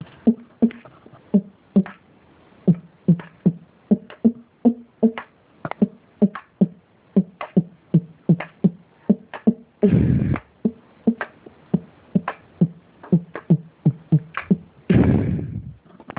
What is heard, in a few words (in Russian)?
Типа струны